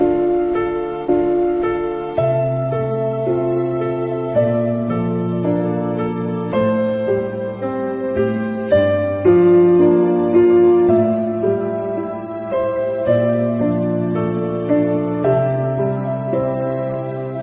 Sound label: piano rags, Sad; Loops